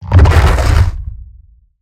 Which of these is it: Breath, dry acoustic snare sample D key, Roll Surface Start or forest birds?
Roll Surface Start